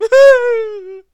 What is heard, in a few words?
Woo Hoo Ho Hoo